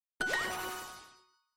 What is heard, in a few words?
in a row correct